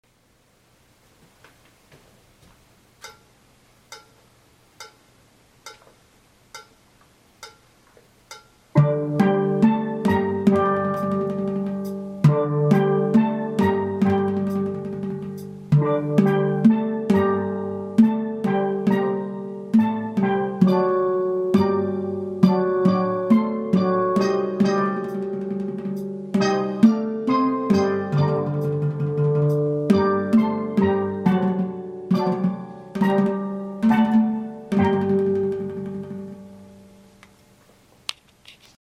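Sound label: Intro Guitar, Steel Band; Bella Ciao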